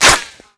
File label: bullet teddy bear, weapons impact; Call of Duty Modern Warfare